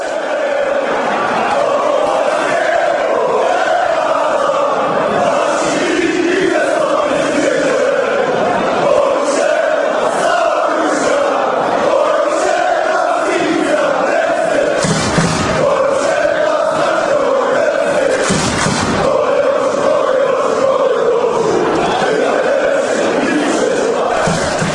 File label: lech posnan chant, fanchants